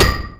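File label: PIPE MTL, TECHNO TRANCE; Pro-Rec - Synthsonic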